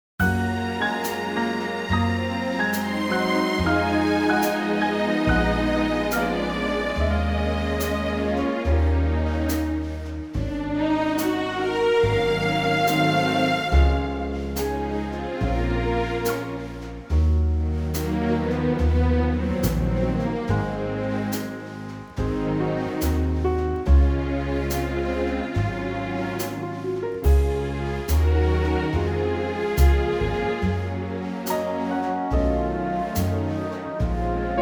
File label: orchestral Db